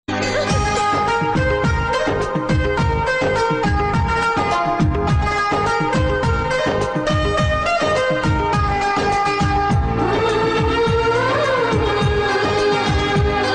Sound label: movie emotional bgm; janaki weds sriram